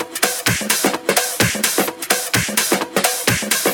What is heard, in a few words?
Latin House Loop 128 BPM; Vengeance Samples